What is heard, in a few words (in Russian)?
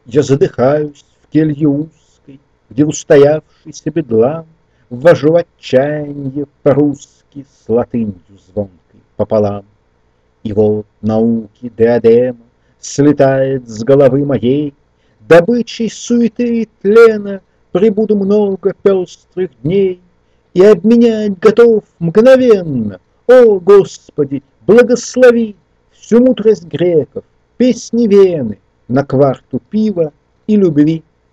luter, voice